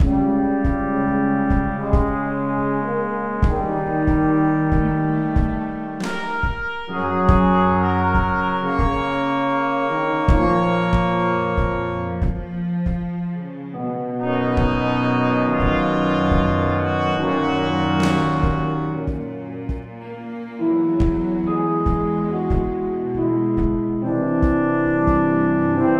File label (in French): heroique